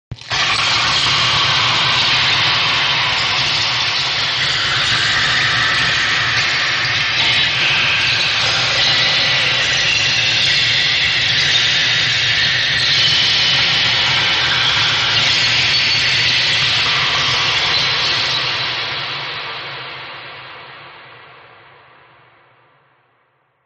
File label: Glass Scream; horror and atmosphere fx; Silent Hill Downpour